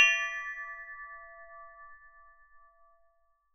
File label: synth